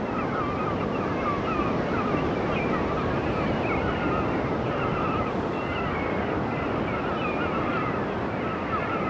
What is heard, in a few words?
ocean; Alexander